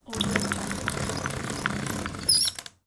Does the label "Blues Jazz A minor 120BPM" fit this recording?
No